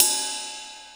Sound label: BELL RIDE, drums; Big Fish Audio; Synth City